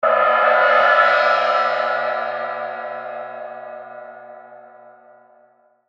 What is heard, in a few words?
tibet sbub chai cymbal